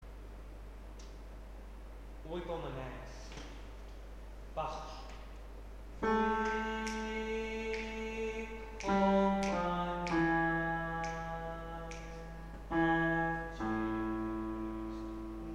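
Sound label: weep oh mine eyes bass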